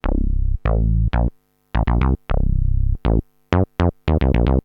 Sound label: funky bass